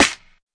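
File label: snare, Classic Hip Hop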